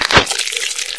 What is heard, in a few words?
bite, bullchicken; mistake coop